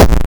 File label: Explosion